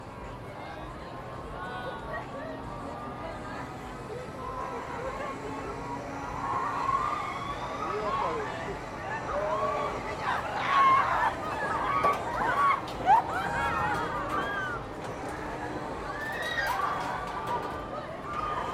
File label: Moscow Carnival; linguistic sounds